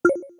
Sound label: notification